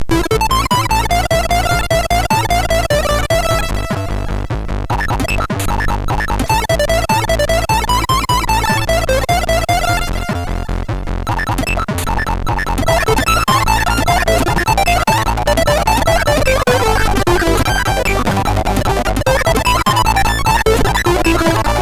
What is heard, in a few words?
riffing off the edge, MOS6581R4; hvsc